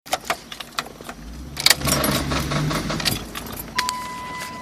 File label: rapid clicking